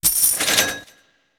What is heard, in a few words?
register